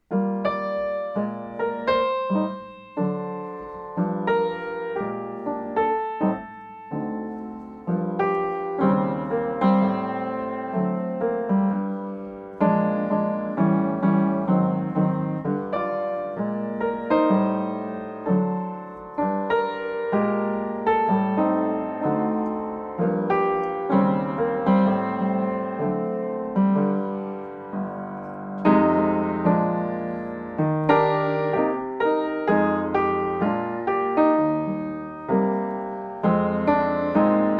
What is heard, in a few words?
swinging turn solo